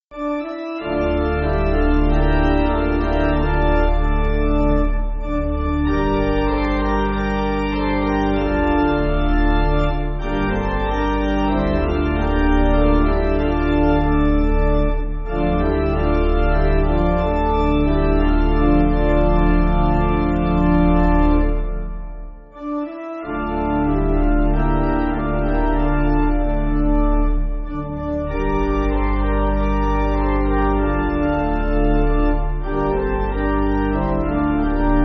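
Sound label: Pipe, Pipe Organ, Music Backing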